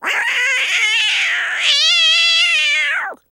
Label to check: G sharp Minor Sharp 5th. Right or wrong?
Wrong